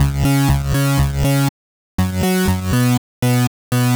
zuperzaw C# 121, synth loop; Zero-G; Soundclash; Nu Dance Electro

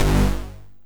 Synth Hit G

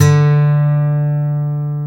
GUI Ac. D3, GUIT AC; Best Service Gigapack 2

